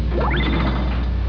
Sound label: DOOR